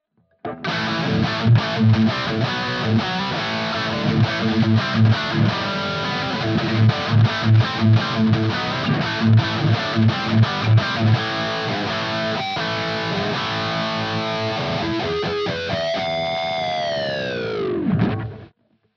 MUSIC MAN L3 lead 1st position rhythm

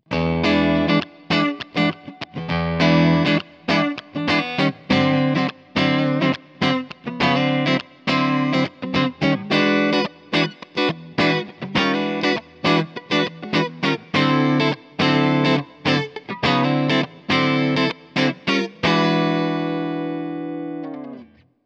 Neck PU Clean; Charvel Marco Sfogli Signature

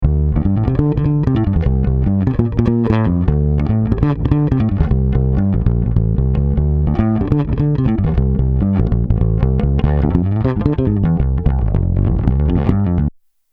Chandler TG 1 Bass Comp 9db gr

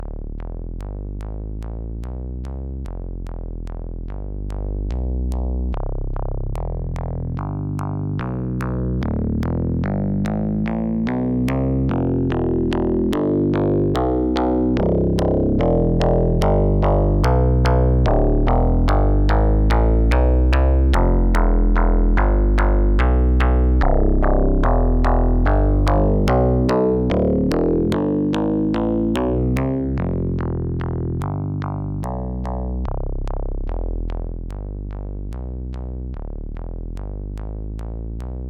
cre8audio West Pest tri saw fold

